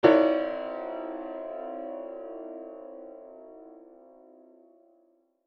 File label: shock piano hit; Joe's Diner